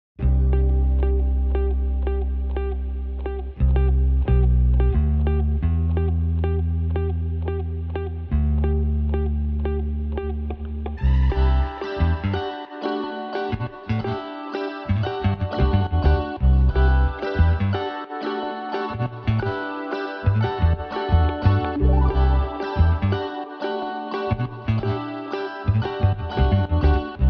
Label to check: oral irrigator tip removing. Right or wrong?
Wrong